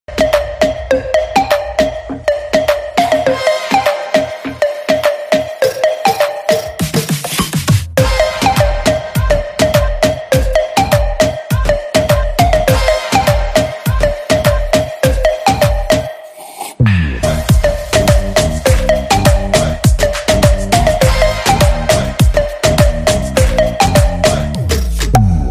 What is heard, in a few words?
Ringtone Instrumental